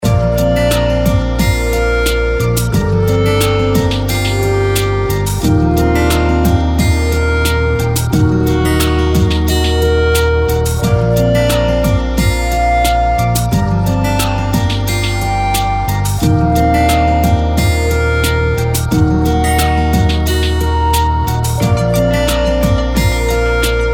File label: healing; maoudamashii; bgm